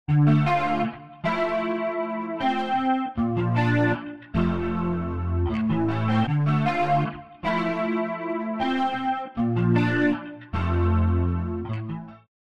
Rotary Speaker Overdriven Nuance Guitar Wet; AmpVibe; Syntheway

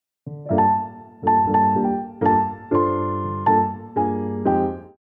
piano

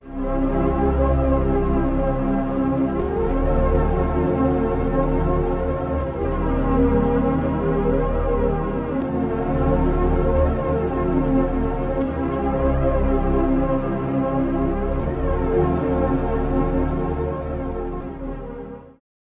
Oscillator PAD Flanging; Alphatron; Syntheway

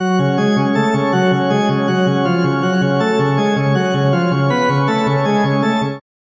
Rotary Speaker Chorale Panning Organ Dry; AmpVibe; Syntheway

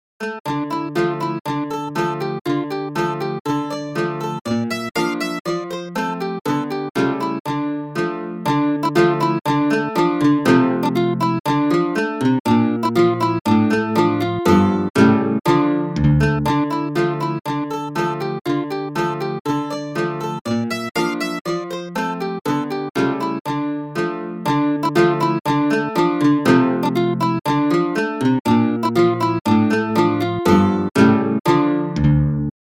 American Ballad Mandolin; circa 1858; Banjodoline VSTi; Virtual Mandolin; Syntheway

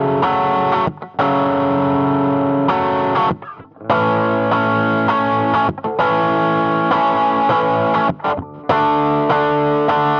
Chorus Quantized Voice Guitar Dry; Vox Quantizer; syntheway

